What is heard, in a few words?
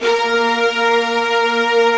fast strings, Fast octave; Optical Media International; Sonic Images Library